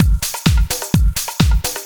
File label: Latin Loop 128 BPM